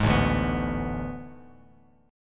A Major 7th Suspended 2nd Suspended 4th, Bass Standard; AM7sus2sus4 strummed; 16,0,0,16; JGuitar